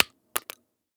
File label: Shell 12ga carpet; STALKER; IWP